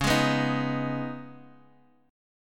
Db 7th Suspended 2nd Db, down, Guitar Half Step Down